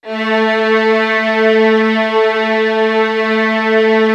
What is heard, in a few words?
A3, LOUD VIOLINS; Q Up Arts; Denny Jaeger Master Violin Library